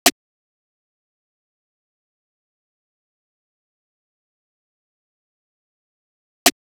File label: Drum Loop - 150 BPM Snare; Drum Loops; Loop Stems; Cymatics; Mystery Pack Vol 4; Konopio Drum Loop